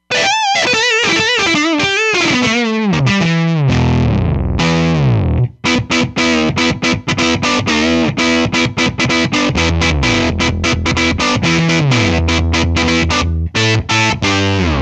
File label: Guitar Rip, Roland Fantom XR